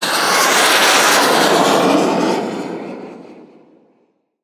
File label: Missile Fly By; Weapons; 1001 Sound Effects